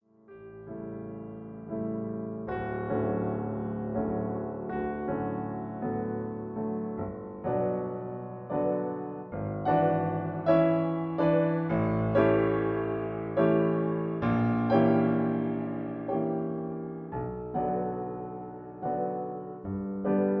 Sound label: Accompaniment D; Dvorak Op55 No4